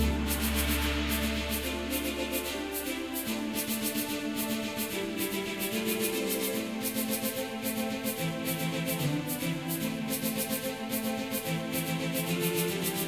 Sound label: Menu start; The Mysterious Past of Gregory Phoenix